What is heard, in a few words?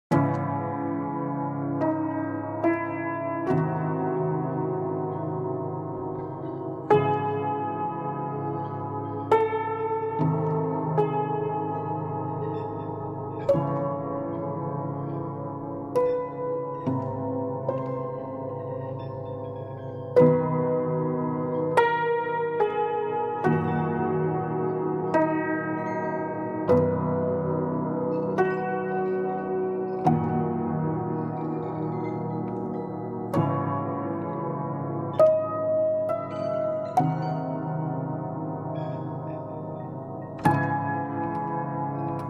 Broken Piano